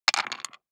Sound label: DICE on Wooden Tray, Throw and Roll, Standard, 4 Four Dice; Samples; ArticulatedSounds; DICE Sfx Party (Extended)